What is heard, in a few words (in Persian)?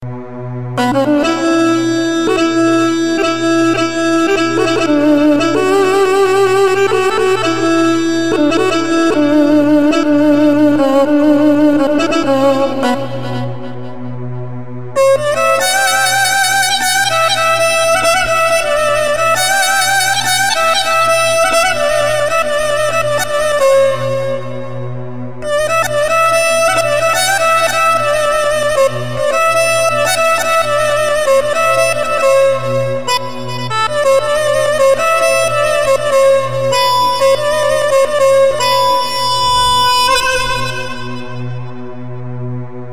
keman do, سازهای زهی